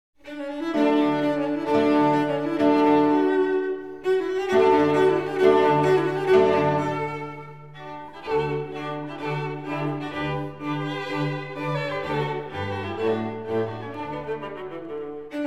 Quintet D major Fandango Allegro maestoso; Quintet No4

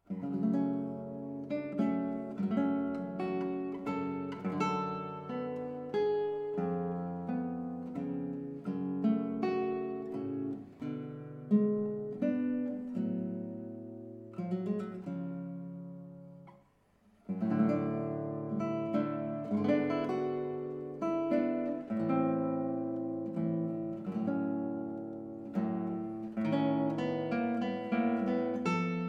Adagio solo; MOZART

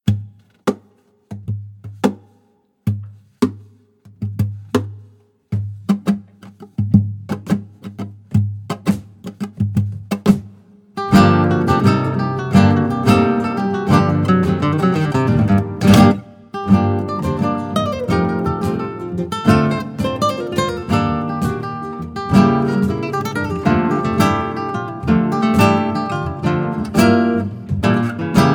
Tangos

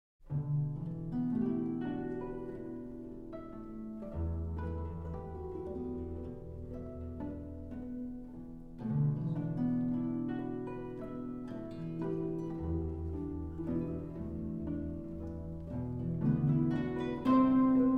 Guitar Orchestra; 3 Preludes

